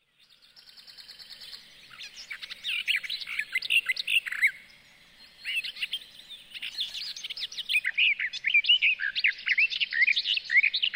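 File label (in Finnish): lehtokerttu, lintuäänet; Kaunis, pulppuileva lavertelu, jota on verrattu puron solinaan; birdlife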